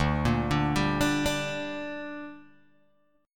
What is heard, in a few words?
D Suspended 4th Sharp 5th D down Guitar Open G, chord